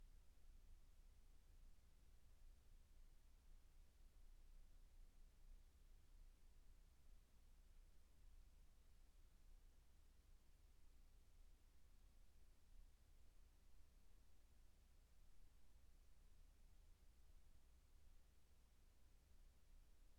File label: room tone